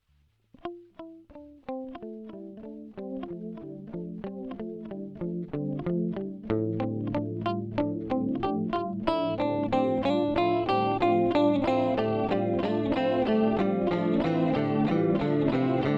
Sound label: Mooer D7 Galaxy Delay